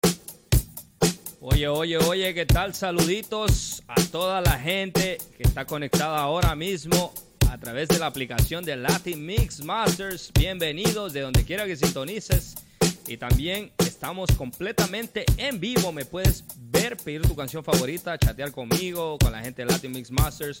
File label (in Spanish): EN VIVO